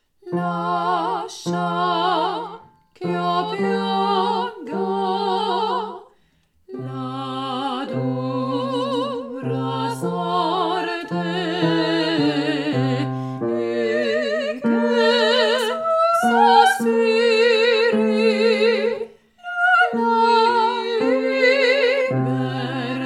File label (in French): Basse (avec soprano); Lascia ch'io pianga; pupitre des basses